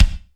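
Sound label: bass drum, real mega drums; Best Service; Real Mega Drums VOL-1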